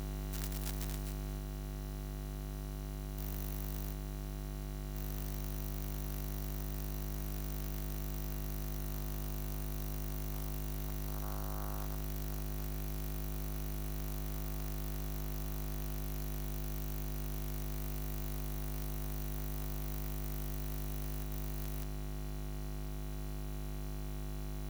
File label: Noise